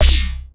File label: confirm